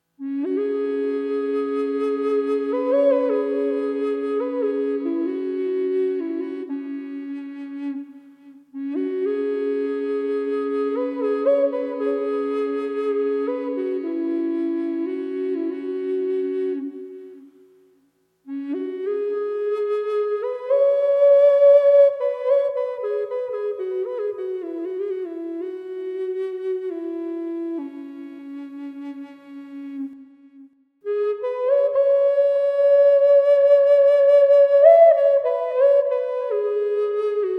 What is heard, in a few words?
Cis with Reverb, Drone Flute